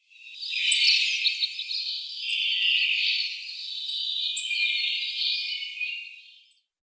Yellow-browed Bunting calls

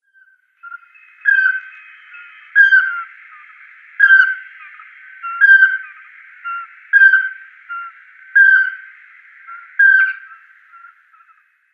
Pygmy Owl pair display

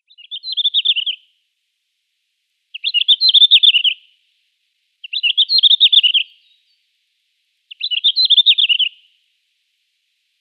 Red-flanked Bluetail song

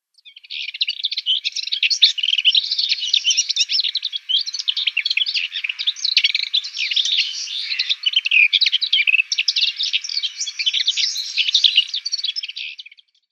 Lesser Whitethroat subsong

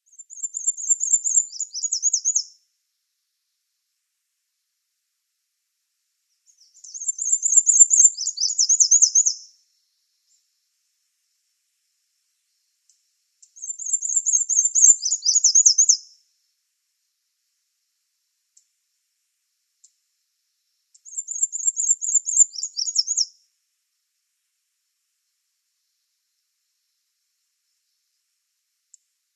Black-and-white Warbler song

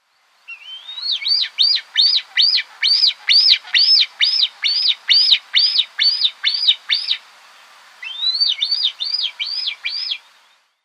Lammergeier nestling

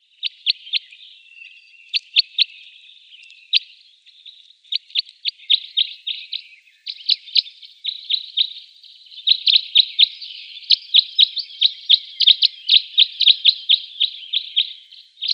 Common Crossbill calls

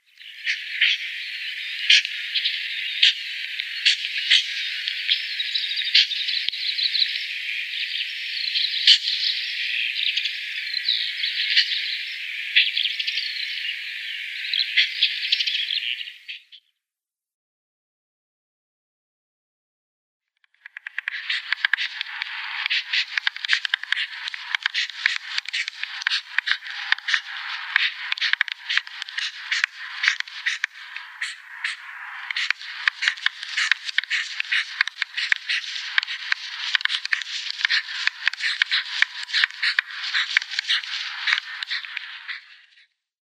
White Stork calls

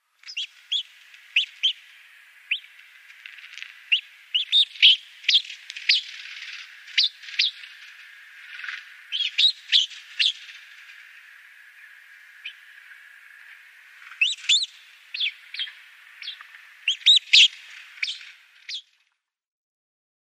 Sinai Rosefinch calls

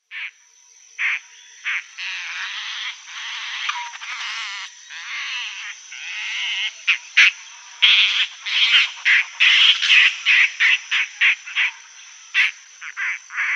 Broad-billed Roller adults calls

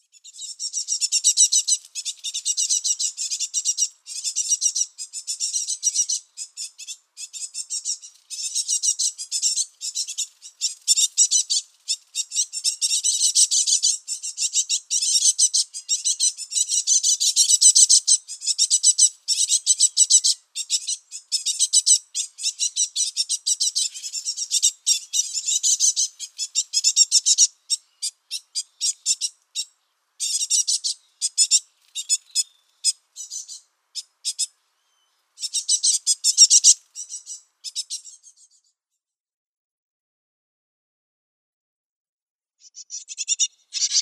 Great Tit nestling

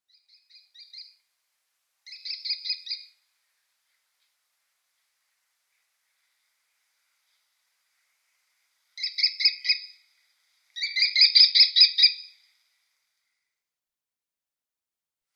American Kestrel

